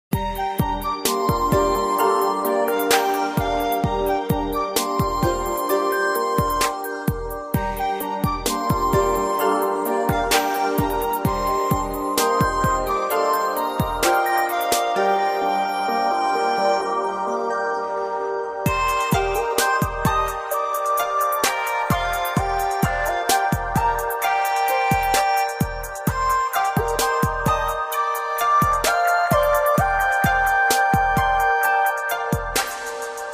THEME MUSIC MENU; Brawl Stars OST; MOON FESTIVAL

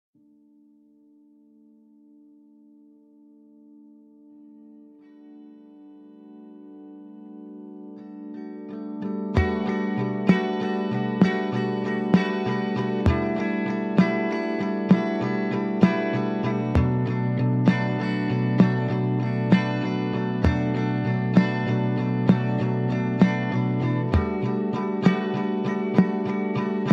Instrumental

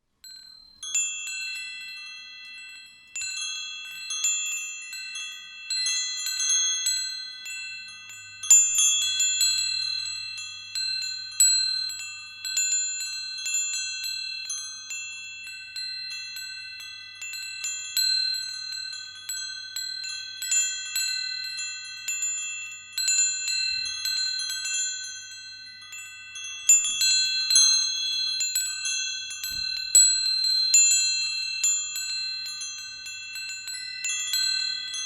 wind chimes sound effect

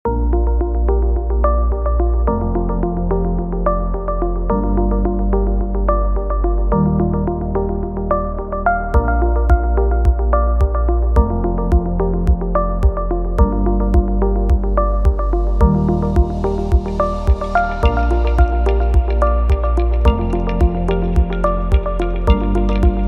calm reverie

